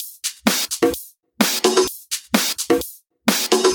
Minimal Loops

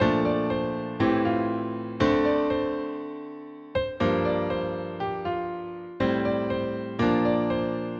Yamaha Grand Piano R&B 120 BPM

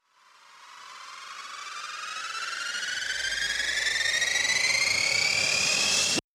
ReverseFx Clean 138BPM; Sound FX; Gminor

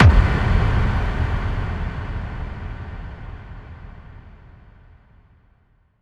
Bassdrums FX, FX Kicks; Vengeance Samples

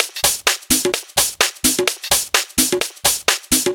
House Loop, 128 BPM; Vengeance Samples

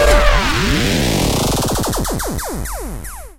Lazers

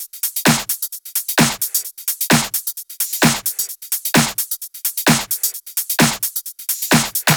130BPM Kit; Vengeance Samples; VFH1 130BPM Danzon Kit

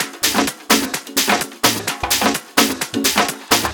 Latin House Loop 128 BPM; Vengeance Samples

